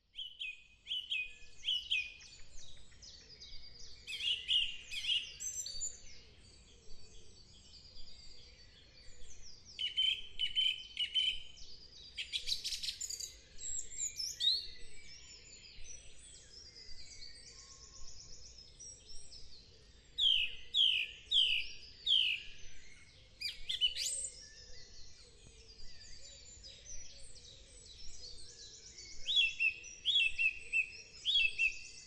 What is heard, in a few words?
thrush